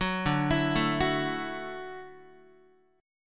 D-Major Add 9th D down Guitar; arpeggiated; JGuitar

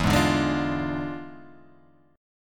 E 7th Flat 9th Guitar Open G, chord

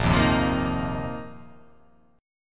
A sharp Suspended 2nd Suspended 4th A sharp

